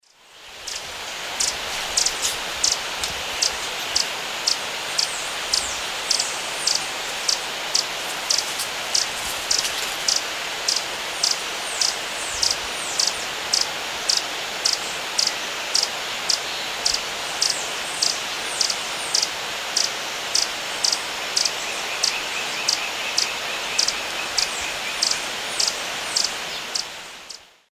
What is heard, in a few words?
Amazilia fimbriata, calls; S. America; Eastern Lowlands